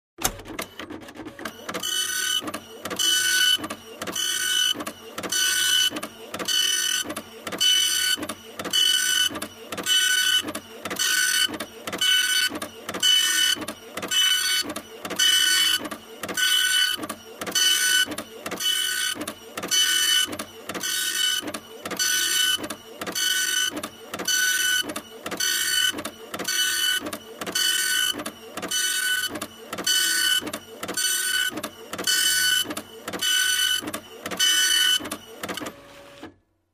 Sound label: Small Label Printer